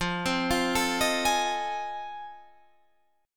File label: F 7th Sharp 9th, Guitar, Open D